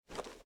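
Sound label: footstep armor; Smite; Baron Samedi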